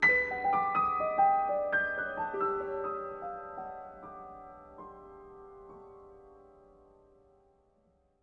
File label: Jingle Piano